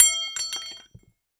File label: Aluminium Tube Drop